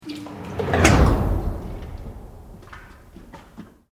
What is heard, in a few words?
Sweep Organic Slow